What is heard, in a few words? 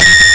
whistle c4; Nintendo; July 2020 Gigaleak